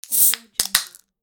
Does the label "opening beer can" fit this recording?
Yes